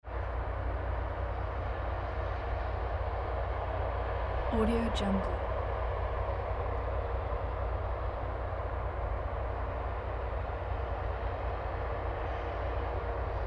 distant harvester is working in the field; mountainaudio

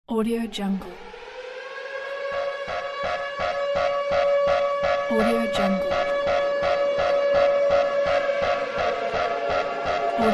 danger alert

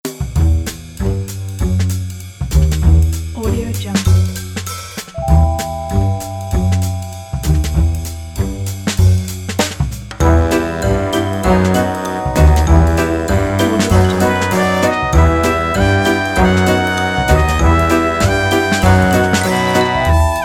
fast suspicious tricky jazz

